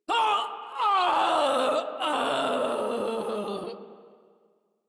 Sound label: Death; Warframe; Venkra Tel